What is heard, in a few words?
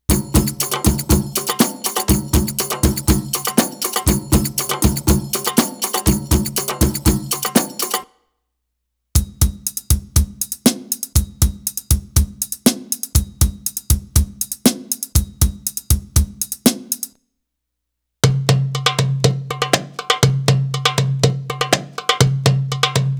Arabic Rhythms